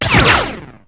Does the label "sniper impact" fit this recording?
Yes